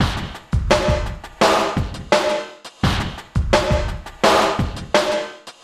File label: Breakbeat, Drums & Percussion